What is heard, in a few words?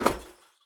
walk proflist; Escape from Tarkov